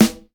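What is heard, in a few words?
clean acoustic snare sample a key, tuned to the A note; snare-drum-samples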